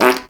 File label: FART, cartoons